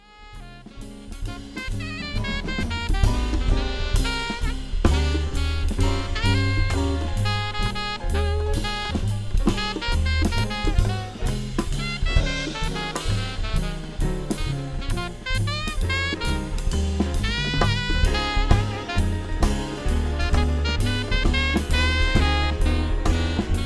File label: mellowtone park; vanderdonk